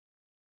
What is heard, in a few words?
Gauss Filt C#1